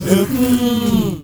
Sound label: MALE WAR, Heart of Africa, shout